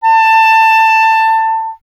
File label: CL A, Clarinet; Miroslav Vitous; Solo Instruments VOL-1